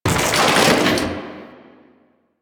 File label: chair destroy; ambience; Warframe; Entrati